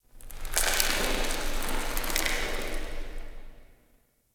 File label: Corpus Infested Dust Fall; Warframe; Ambience